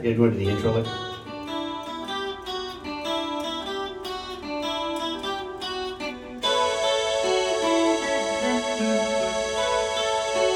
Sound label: lead lick only